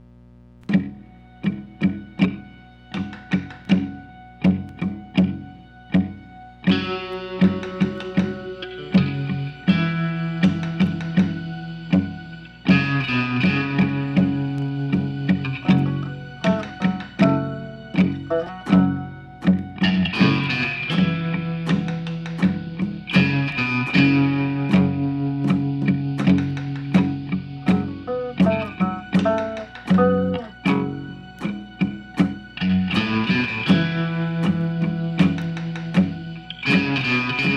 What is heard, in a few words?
Stinger Calm